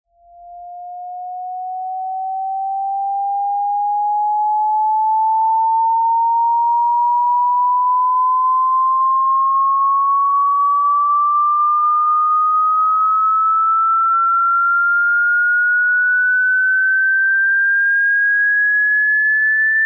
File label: dog whistle